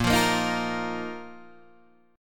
A sharp Suspended 4th A sharp down Guitar Half Step Down